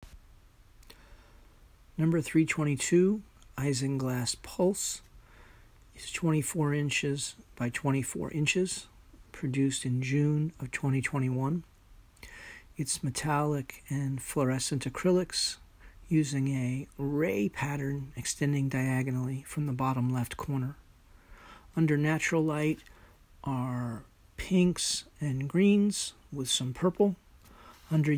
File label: Pulse